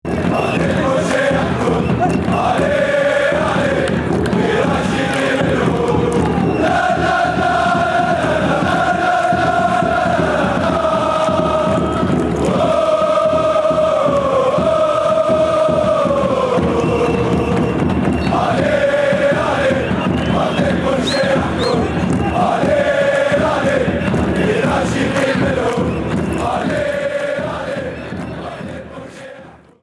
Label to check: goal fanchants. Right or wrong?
Right